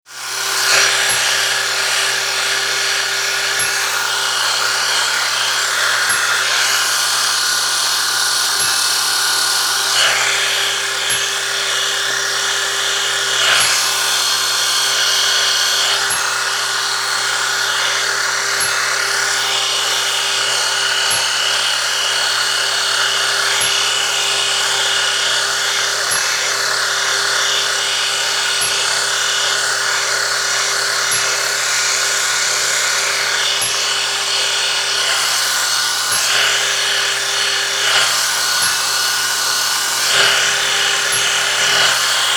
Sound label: Shaver Or Electric Razor